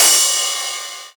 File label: long room reverb ride cymbal sound sample f sharp key